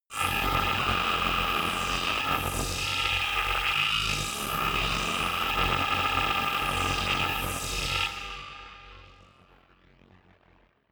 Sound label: drone rough creep; musikelemente; drones